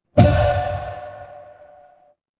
transition braam

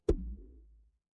cartoon pop

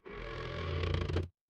door creak

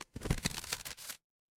paper fabric crumple

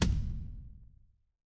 transition impact hit

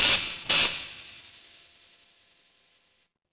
fire electric spark

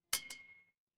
glass clink

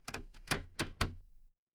door lock

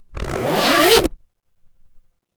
paper fabric zipper

